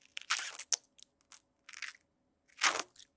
kiss; Lewd SFX

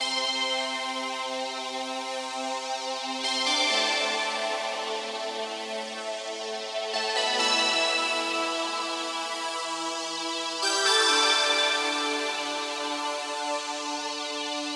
Bell Waves

